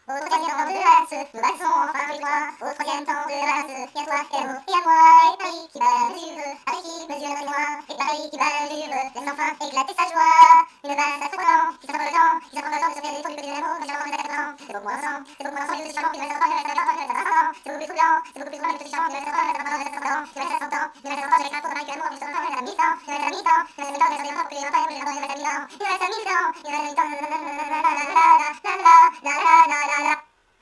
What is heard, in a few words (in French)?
version rapide (très)